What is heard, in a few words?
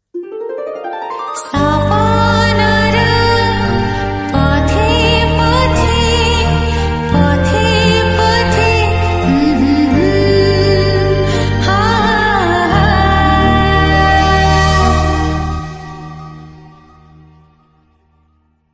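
female, Odia Song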